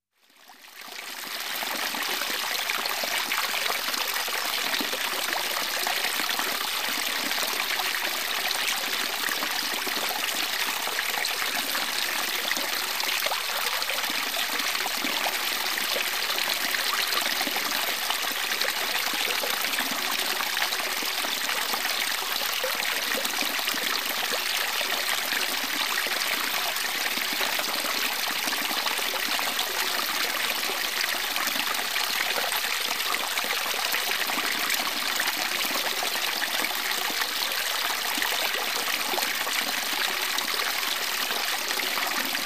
nebolshoj ruchej

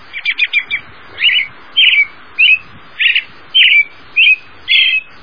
malenkaya ptichka izdaet ochen gromkie zvuki

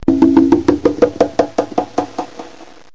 bongo perezvon voshodyashij

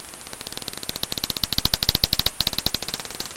zvuk krilev letuchej mishi

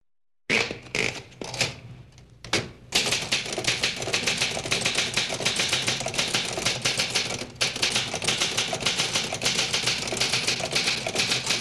pishushaya mashinka mehanicheskaya bistrij temp pechati

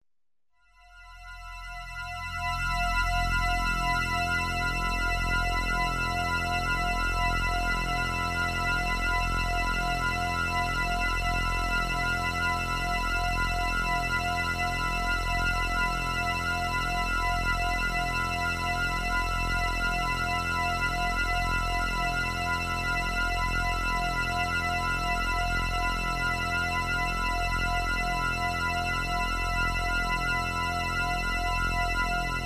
sintezator medlennij temp vibriruyushaya struna; ispoved serdca

